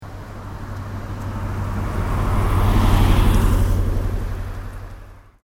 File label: Bus Japan Passing; Japanese Bus; Busses (Sound Effects:Vehicles)